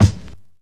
subtle reverb bass drum single shot g sharp key, steel kick drum sound